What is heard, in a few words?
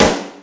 snare